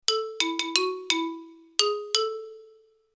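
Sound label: sounds with effect of completion, ending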